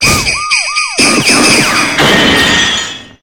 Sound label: Police